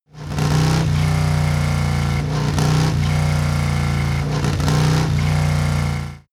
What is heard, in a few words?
motorcycle harley engine rev triple